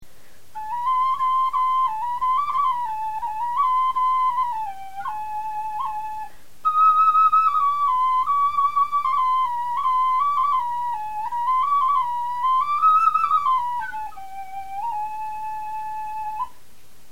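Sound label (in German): melodie, Knochenflöten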